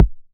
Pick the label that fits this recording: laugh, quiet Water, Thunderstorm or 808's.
808's